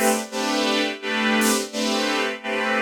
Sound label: Pad DS201 170 A, sidechained